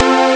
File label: strings maj; Amiga Soundtracker Sample Packs